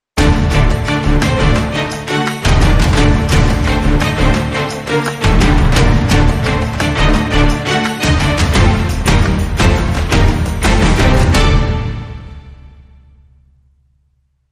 Sting No Lead

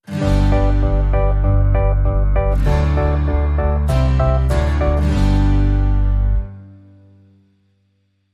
Stinger No Drums

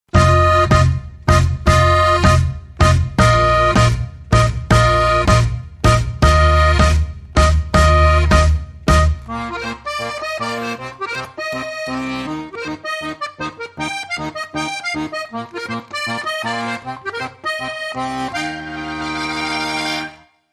With Vox

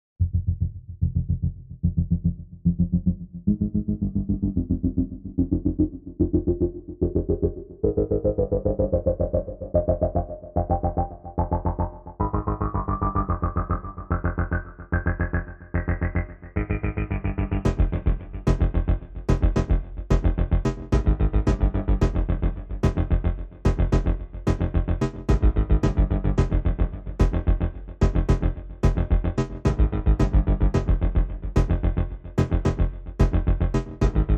Sequences Only

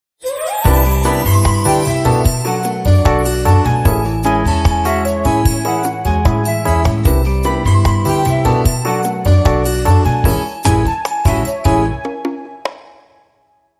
Bossa

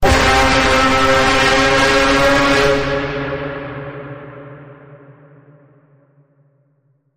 Bend, C

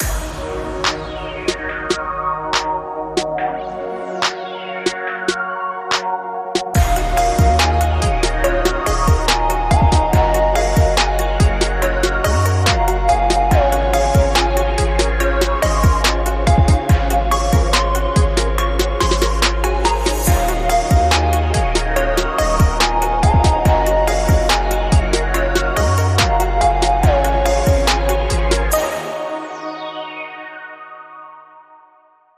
Funky

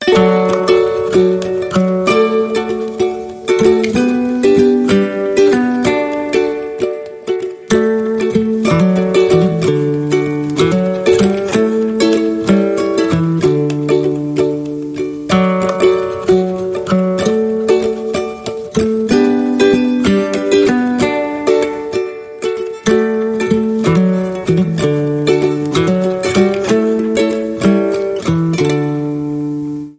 Ukulele Guitar No Bells

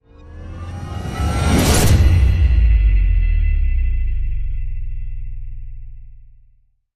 Rise To Hit; Rise To Hit Vanish

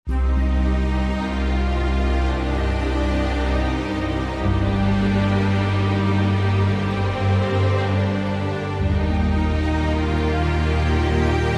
No Bells Glockenspiel

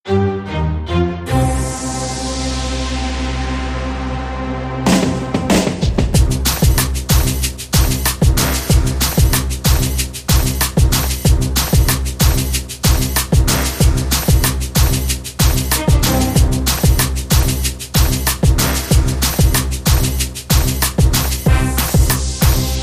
Classic Shutter

